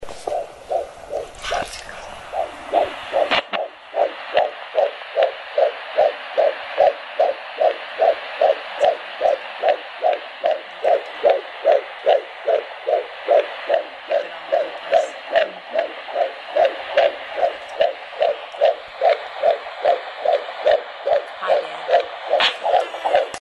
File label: fetal sounds